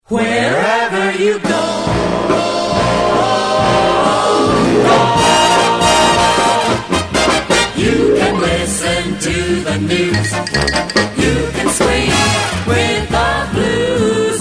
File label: jingle; nab 1964 lively companion